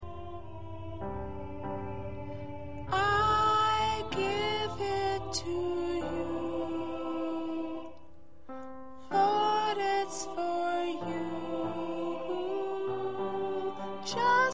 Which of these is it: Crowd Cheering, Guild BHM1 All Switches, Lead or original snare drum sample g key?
Lead